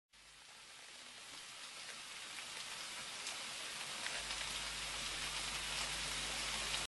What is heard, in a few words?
bacon sizzling in a pan; BACON SIZZIL